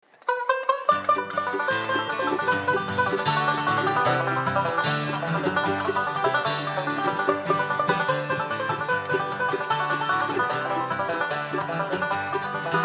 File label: banjo